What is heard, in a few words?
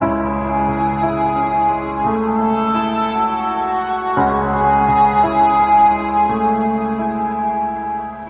piano strings, sad loop; loops